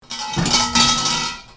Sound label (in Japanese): suzu; svencoop; road to shinnen